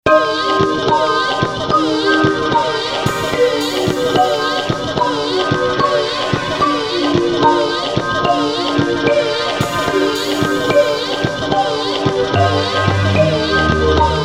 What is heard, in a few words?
pump in mix